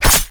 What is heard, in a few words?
water sickle